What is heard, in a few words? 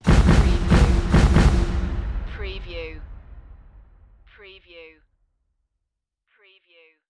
Musical